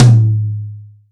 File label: Toms, Real